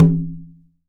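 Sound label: Percussion Conga; Drums; Acoustic and Electric; Polyend; Simulacrum Sounds; 1 Shots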